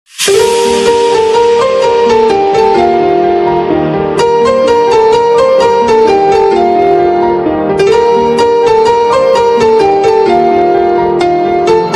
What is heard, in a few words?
feeling the song ringtone